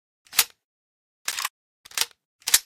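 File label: grenade load, weapons; g36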